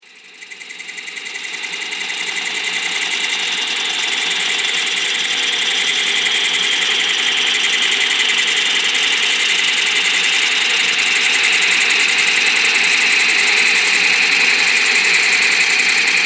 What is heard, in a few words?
Fear Of The Dark Drone; Scary Soundscape Sound Effects; Horror Sound Effect